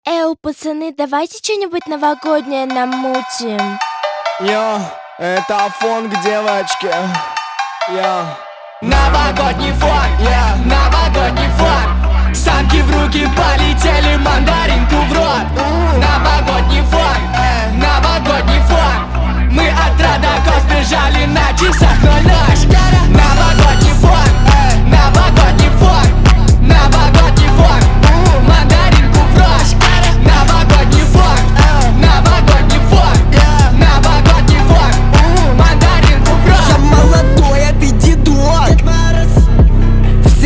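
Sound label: New Year's Phonk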